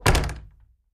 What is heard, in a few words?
Attic Closet Door Clo